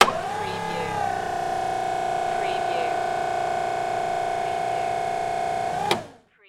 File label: Hi Lo Motor Operate